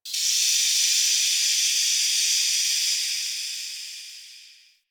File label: Steam Blast; Warframe; Ambience; Hub; Tenno Junction Relay